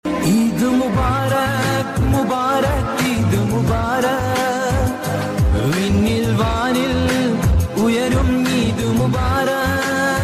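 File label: eid mubarak ringtone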